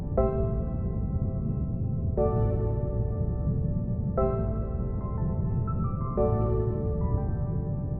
intro splash loop; netent

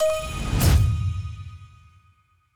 purchase button start click; netent

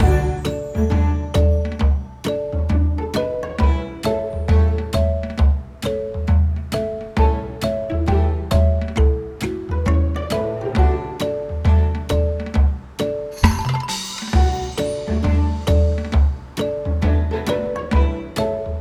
Ambience General; netent

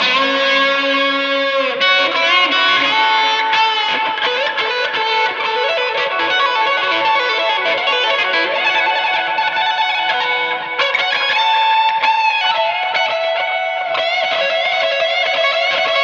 super mega win loop; netent

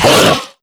Grunt Hit Light; netent

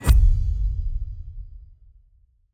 spin button click; netent

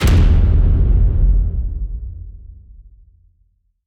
bonus Feature Start; netent

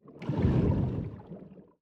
spin start; netent

